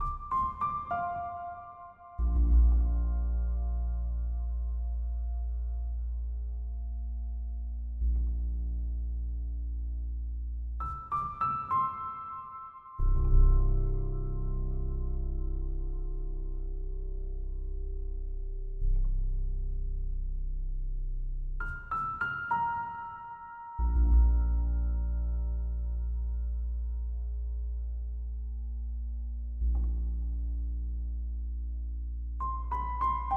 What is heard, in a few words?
rainforest intro loop 100bpm CMajFMaj